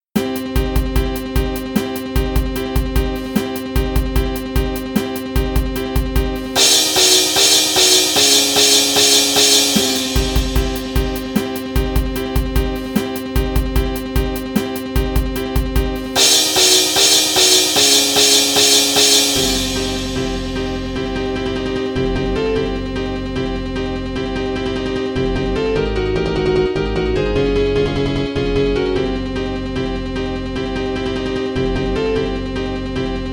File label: chord; Chords